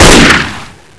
sl8 shoot

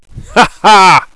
laugh

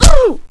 bhit flesh; nst player

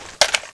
sl8 clip out

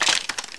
twirl

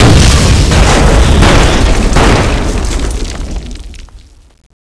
bazooka scud explode

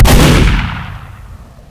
scattergun shoot; chicken fortress 2

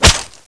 catapult

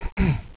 cough

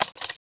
uzi deploy

